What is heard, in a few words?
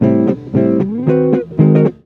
EGUIT BREAKS; Best Service; World Colours